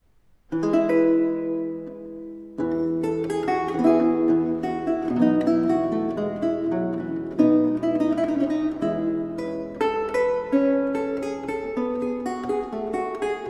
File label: Praeambulum, Lute